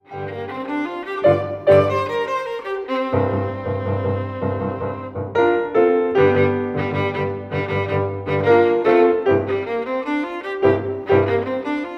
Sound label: VIOLIN